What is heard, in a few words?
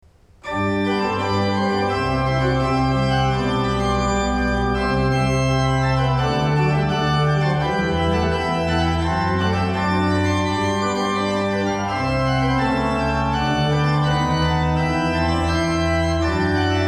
Organ, Prelude; Resurrection Prelude